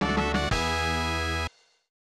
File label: Level Up; Super Robot Wars Taisen 3 1993 SNES